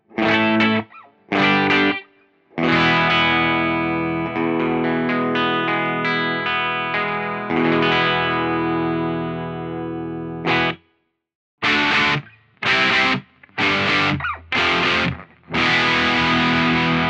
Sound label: M900 rig HUM; JCM900